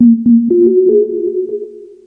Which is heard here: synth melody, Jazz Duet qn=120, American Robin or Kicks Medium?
synth melody